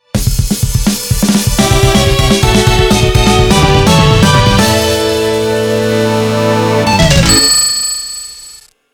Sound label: Jingle Act Clear